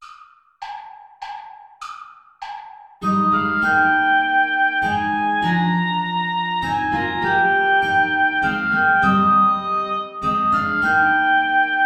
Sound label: play along without guitar